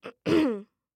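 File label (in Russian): zvuki pershenie v gorle kkhm, sounds tickle in the throat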